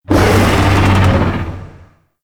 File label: Void Dragon Vocal Pain; Enemies; Vocals; Warframe; Duviri